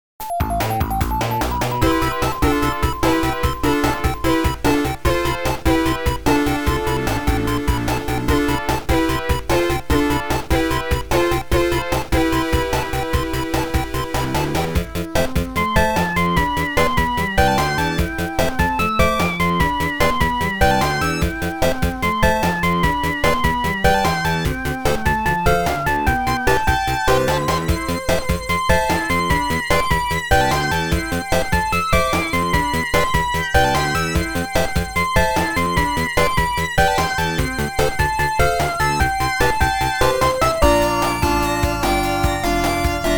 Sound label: fm synthesis